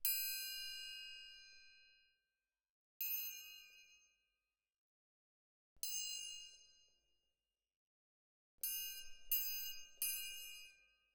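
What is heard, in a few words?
Percussion, Jazz for piano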